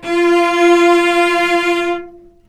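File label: cellos attack F4 R, String; East West; The Ultimate String Collection; VCS ARCO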